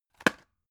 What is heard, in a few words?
drug package drop deep hard; arzt